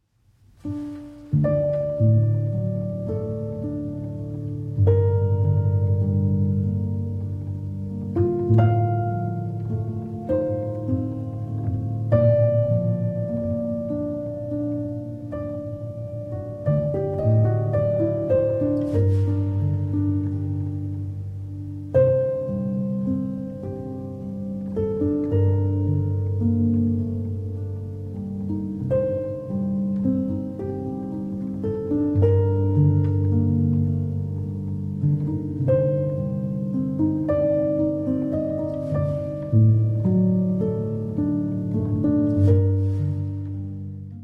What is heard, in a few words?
Mazurka in G Minor, for Piano; Op. 67, No. 2